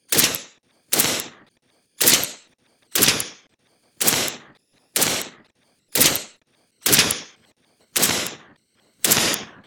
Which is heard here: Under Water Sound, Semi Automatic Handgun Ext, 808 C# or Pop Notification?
Semi Automatic Handgun Ext